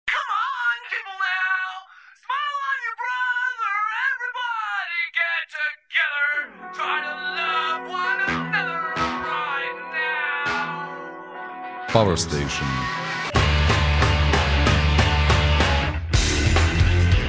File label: jingle